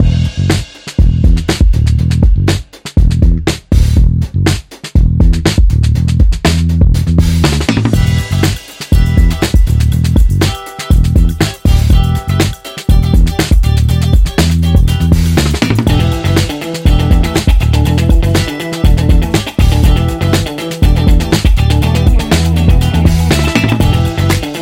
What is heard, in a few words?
Chic Funk; casino